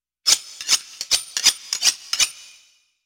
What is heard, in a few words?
Sharpening Knife; Halloween Havoc - Sound Effects; Ktel